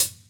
loud hihat sample e key

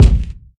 warm steel kick drum sound g sharp key

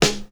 natural acoustic snare g sharp key; Duration 0:01; Stereo; snare drum samples

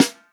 warm acoustic snare sample c sharp key; snare drum samples

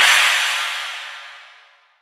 long room reverb single cymbal hit a key; Duration 0:02; cymbal drum samples

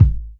studio bass drum one shot A key; kick drum samples

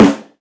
original snare drum sample c sharp key; Mono

